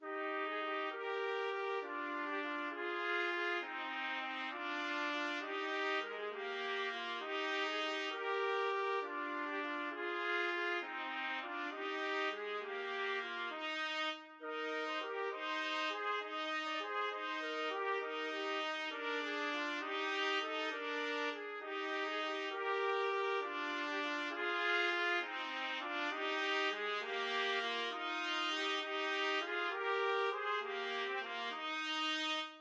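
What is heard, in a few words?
sonata trumpets; trumpet duet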